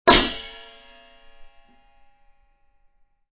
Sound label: hit